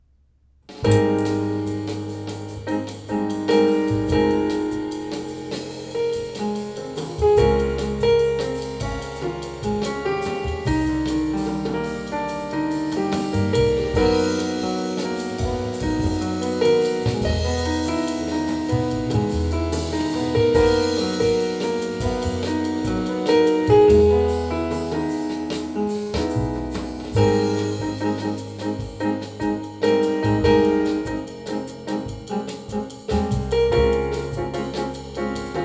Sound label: Jazz